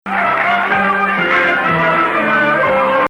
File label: Ajam